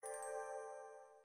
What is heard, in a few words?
Lock